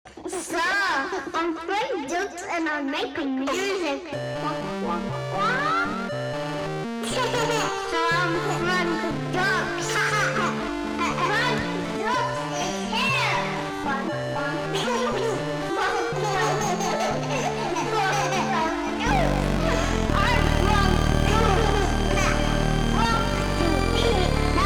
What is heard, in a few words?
120bpm No Drums